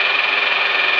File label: engine; AssaultCube; Alien Stars; American Muscle Series